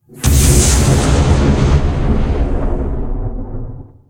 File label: Explode; bejeweled 2 deluxe